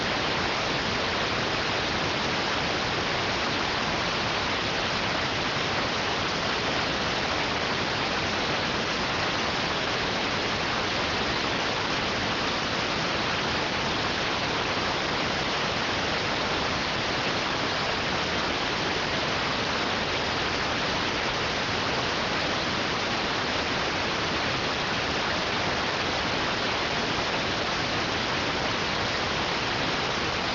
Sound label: rtty rx